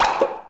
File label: item drop; ui